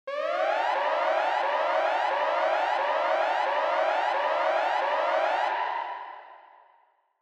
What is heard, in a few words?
Warning Siren Reverb Low Long